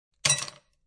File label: Ice Cb Thrw One, Ice cube thrown in glass.; Drink Mixing (Sound Effects:Bars)